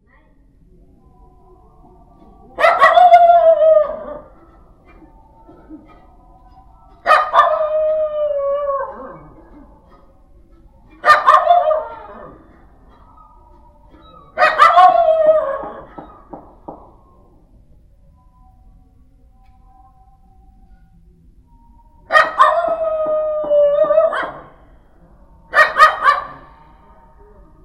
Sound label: just the dogs; radhakund